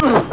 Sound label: ungh; Red Wolf